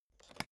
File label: suitcase pull down latch lock